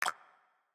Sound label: Click; SNKRX